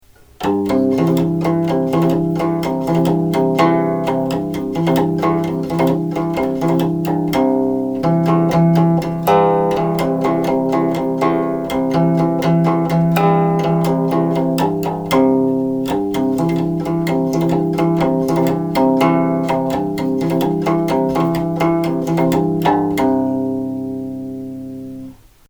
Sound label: reveille